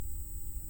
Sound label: Hydrophone